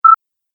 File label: Sine Wave Confirmation, beep